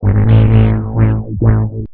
wow, bass

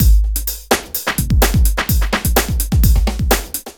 funky breakbeat; Loopasonic

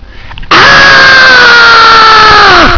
argh

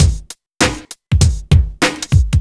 swingy 99, drums; Loopasonic

